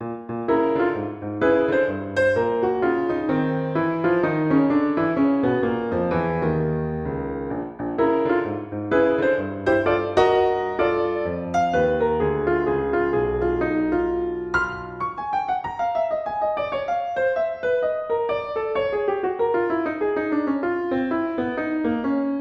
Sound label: Zombie Rock